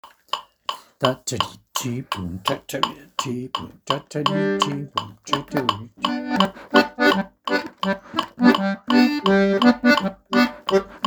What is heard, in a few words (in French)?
Tsigan accords au rythme; Scottish Un Tigan